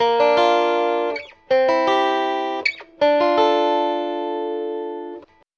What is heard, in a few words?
guitar, Loops, Phonky